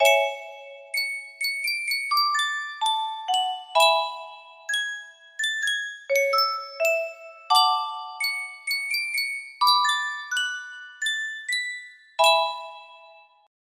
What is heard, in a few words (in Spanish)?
caja de musica